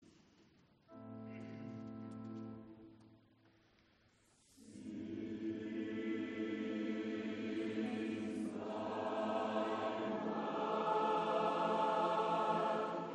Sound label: CHORUS Since by man came death; Messie Handel; Concerts MESSIE de HÄNDEL